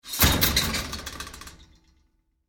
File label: Household Fireplace Door Open Hang Metal; Fireplaces